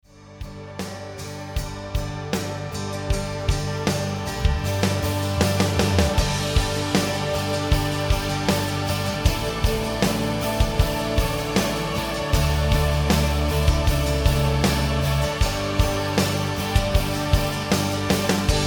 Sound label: rhythm